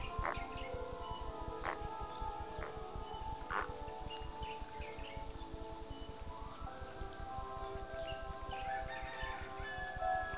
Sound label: tellbird; radio work